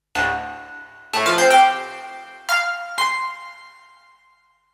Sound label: Sony DRE 2000 Modal Reverb